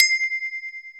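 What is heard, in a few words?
R8 percussion; E-Lab; X Static Goldmine 1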